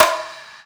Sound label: Snare; Percussion